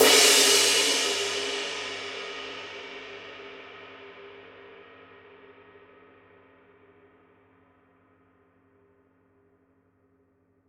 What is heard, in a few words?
master 22 dark ride edge, paiste; masters